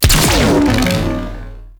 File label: Split Laser Shot; Warframe; Weapons; Corpus